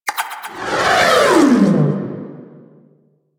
Animal Conservation Drone Spawn Whoosh; Warframe; UI

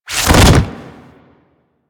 Tackle Start Foley; Manic

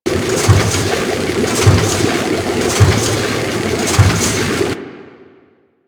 Ocean Bilge Pump Loop; Ambience; Props; Warframe; Grineer Ocean

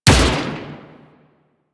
Arch Dual Pistol Fire, Weapons; Warframe; Archwing

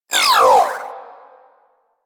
Vocal Pain; Warframe; Pets; Zanuka

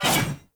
Sword Whoosh Heavy; Weapons; Player; Swords

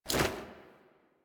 Generic Weapon Light Aim Transition Out; Warframe; Weapons

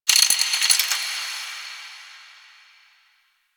Fortress Chains Small; Warframe; Grineer Fortress

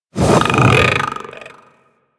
Levitate Start; Enemies; Abilities; Sentient Brachiolyst

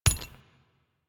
Flak Cannon Shell Impact

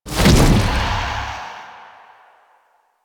Shield End; Warframe; Warframes; Garuda

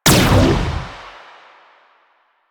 Gunbrella Shot Laser, Weapons; Warframe; Tenno; Koumei

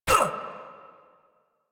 Voice Enemy Hit Standard Noise; Warframe; Caliber Chicks minigame

